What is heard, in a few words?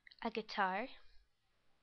a guitar